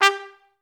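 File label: staccato G3; Best Service; Peter Siedlaczek's Advanced Orchestra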